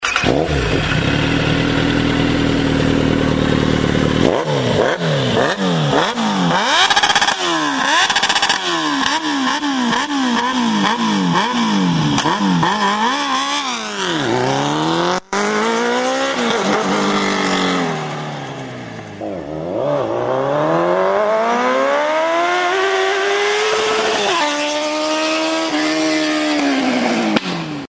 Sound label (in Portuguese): som de moto kawasaki z750